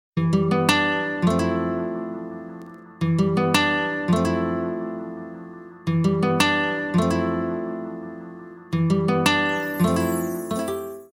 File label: Instumental